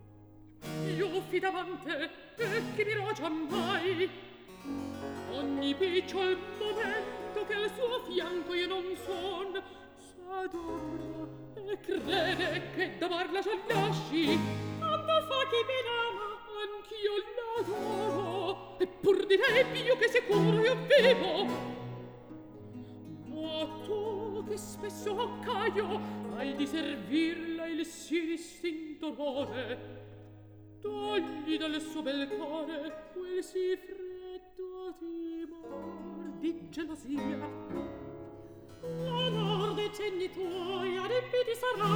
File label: Recitativo; Atto I Scena 4; Ottone, Caio; Antonio Vivaldi; Ottone in villa (2010)